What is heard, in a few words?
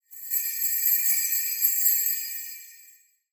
jingle bells